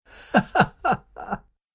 Ha Ha Ha Laughing; Laughter Sound Effects

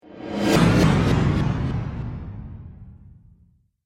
Flashback Transition Whoosh

